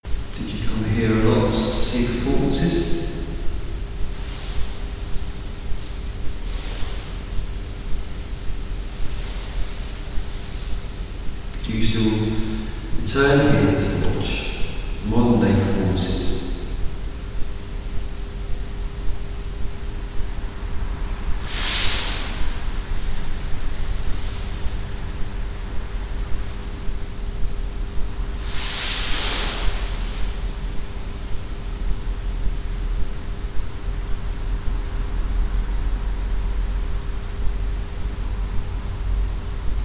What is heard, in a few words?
music